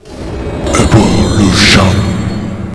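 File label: zombie haojiao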